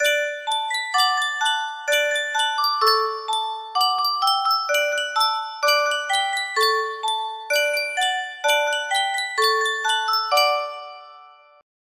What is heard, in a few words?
Sankyo music box